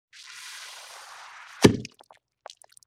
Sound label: Wet Squoosh Pop, Vintage Cartoon